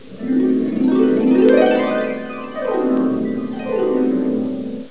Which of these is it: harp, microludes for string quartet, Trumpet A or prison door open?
harp